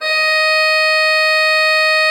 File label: D5, Cathedral Organ, HOLZPRINZIP; Propeller Island